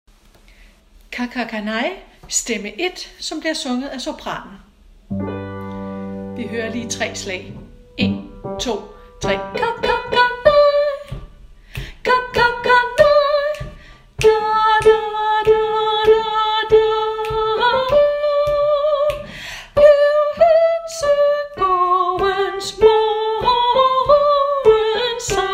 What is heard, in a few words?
Kakakanei sopran